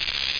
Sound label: shock; davidson